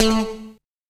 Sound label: GTR; AMG - Now CD-ROM (Roland); Art of Samplng